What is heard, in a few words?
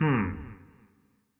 Grunt; Marv